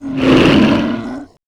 alert, alpinepredator; slender; tera creatures

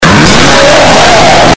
meow; csgo

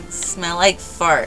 like fart

